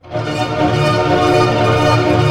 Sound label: ARP A MIN PP, STR ARP; Sound On Sound; Peter Siedleczek's Orchestra